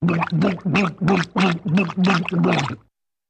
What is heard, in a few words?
Funny Drowning Water